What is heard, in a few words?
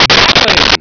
gatling